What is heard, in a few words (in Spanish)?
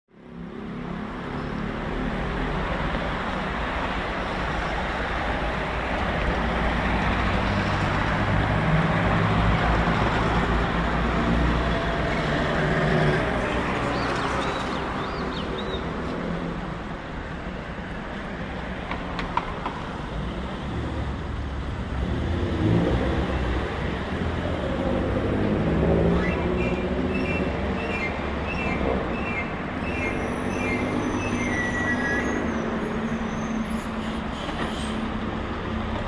calles; mendoza y av o lagos